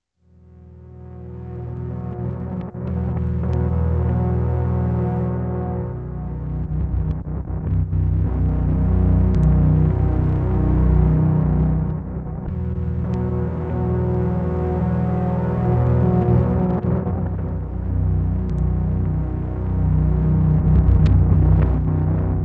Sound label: tape